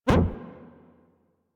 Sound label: Corrupted Notes; Warframe; Bard Quest; Bard Boss Fight